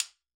Steel Drum Sticks B4, Calypso Steel Drum; Percussion

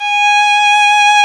ARCO VIOLIN, Arco Solo Violin

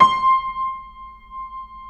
Keyboards Acoustic, C5; USB Soundscan vol.17; AKAI

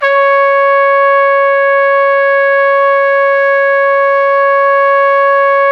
C#4, Pop Brass; Best Service ProSamples vol.19; AKAI

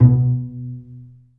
Bass Piz G B1; Digital Sound Factory Virtuoso

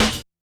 snare, Hardcore Breakbeat; Big Fish Audio; Hardcore Breakbeat Action; Warned

